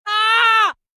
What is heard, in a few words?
scream